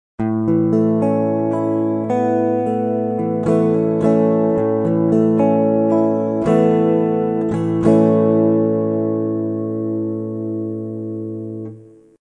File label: Asus2